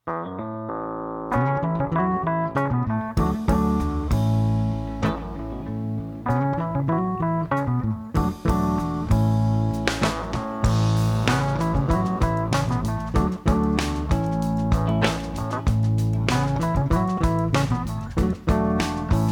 mix verb good, Entire mix with a good balance of reverb; Mixing for God audio examples